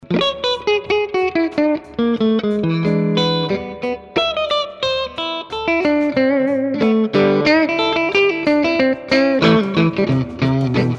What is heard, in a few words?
American DR332 guitar